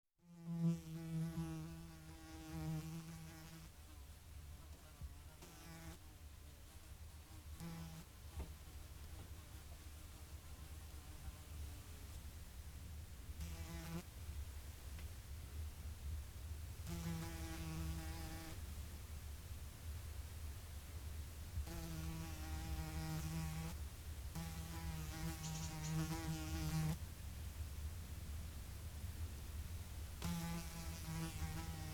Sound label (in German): Fliege